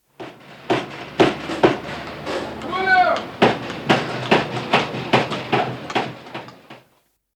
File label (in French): chantier effet creneau